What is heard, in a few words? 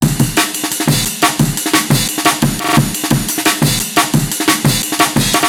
amen